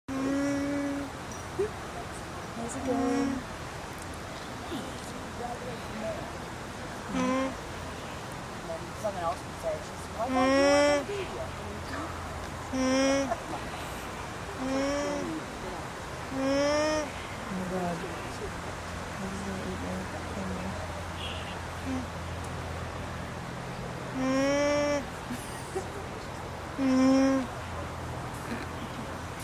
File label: alpaca; آلپاکا